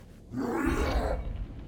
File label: opening yell; hanged man; HLD